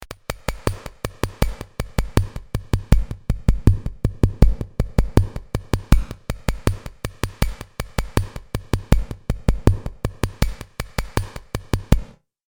Tilt Shelf; fab q2

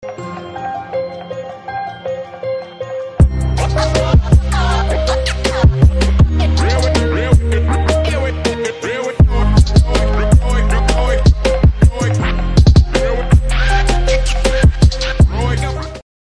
scratch in flstudio

